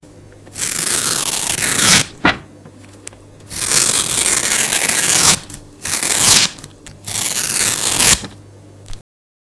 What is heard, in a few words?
paper